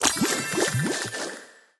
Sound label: Fish Swim